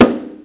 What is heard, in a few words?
gedombak anak